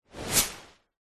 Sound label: foley tree leaves branch whoosh impact designed